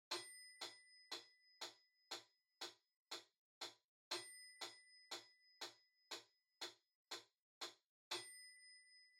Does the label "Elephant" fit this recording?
No